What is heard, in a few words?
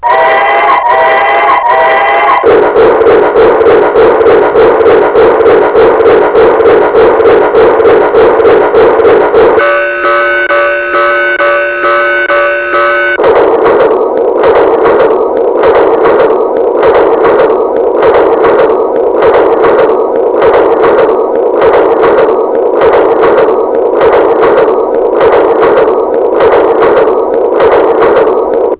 train sound